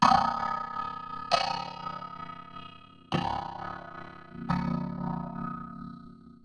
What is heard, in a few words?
Ring Mod, Cymbal, contact mic; Murf & Ring Mod